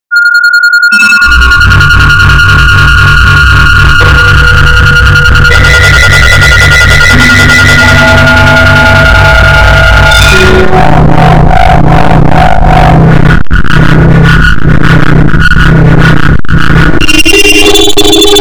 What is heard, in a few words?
noise